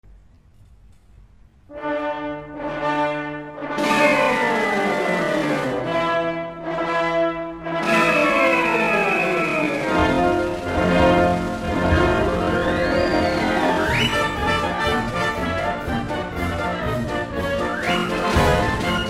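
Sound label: Circus March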